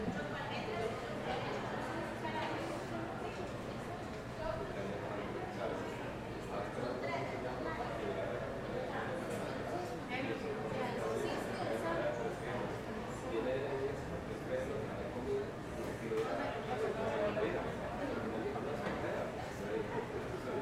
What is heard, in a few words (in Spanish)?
Cafeteria